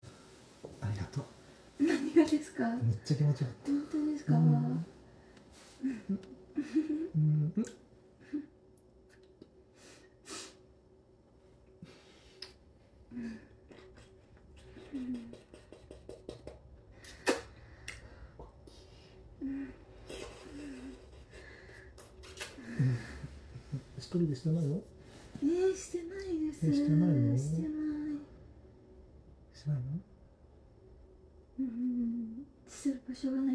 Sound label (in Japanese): クンニ; Audio Denoise